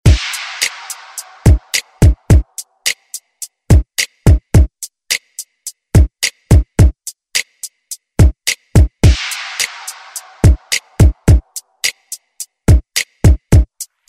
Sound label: Top40 Redrum